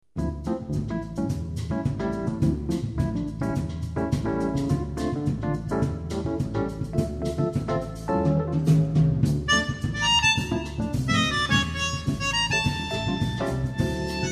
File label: Bossa Nova